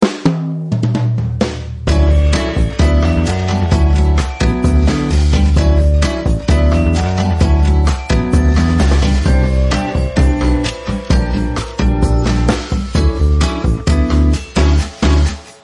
jazz elevator

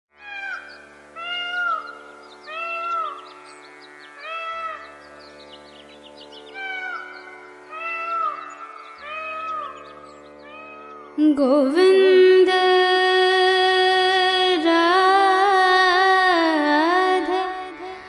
mantra female voice with tanpura and sitar; Govinda

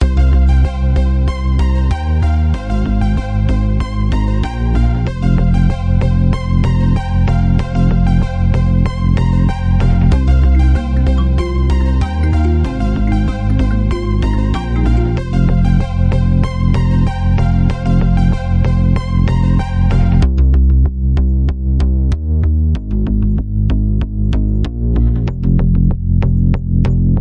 Ringtone

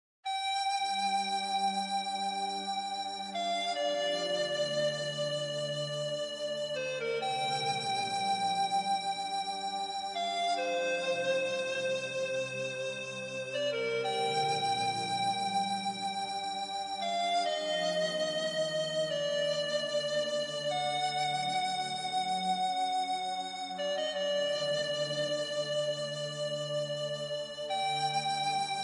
Chinese flute relaxing music short

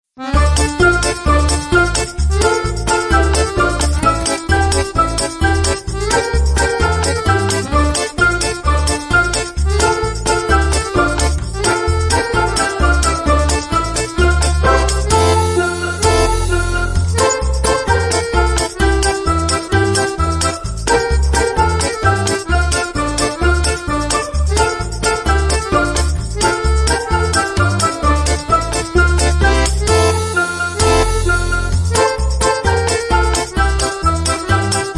Folk polka

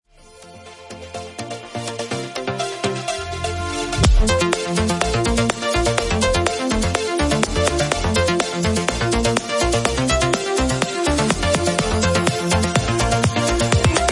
Ringtone

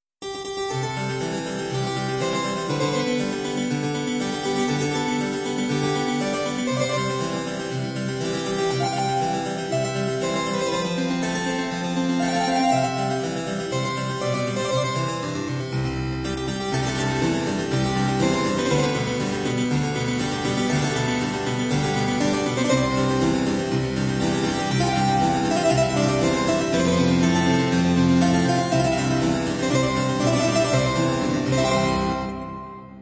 Happy birthday classical version

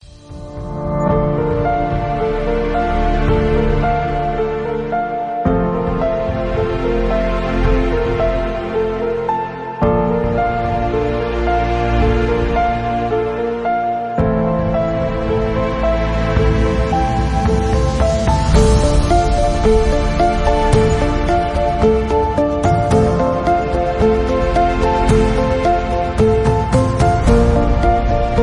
Cinematic motivational

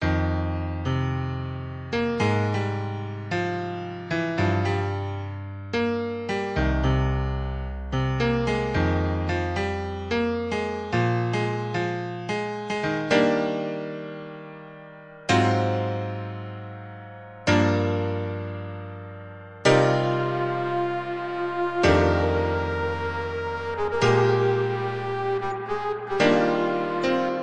Investigation noir jazz

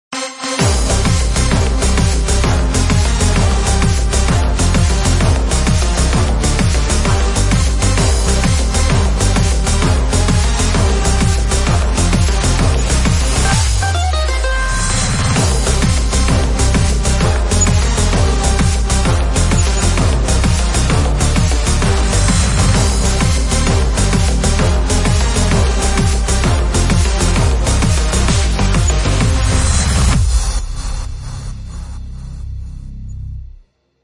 Club dance tarantella short background music; for video

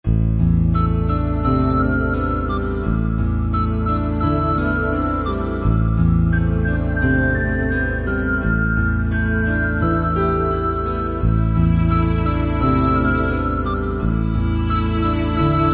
Bollywood sad bgm

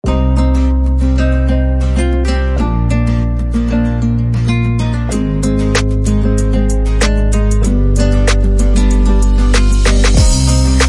background music for ringtone

